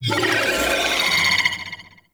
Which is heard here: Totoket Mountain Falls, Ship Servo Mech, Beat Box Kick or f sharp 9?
Ship Servo Mech